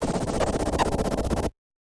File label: Casio, Glitched, malfunctioning synth